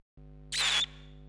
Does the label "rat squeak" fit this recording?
Yes